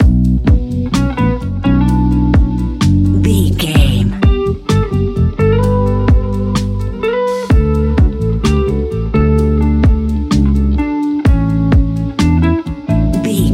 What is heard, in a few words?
calming chill spa world